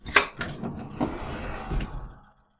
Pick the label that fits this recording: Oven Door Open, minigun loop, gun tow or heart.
Oven Door Open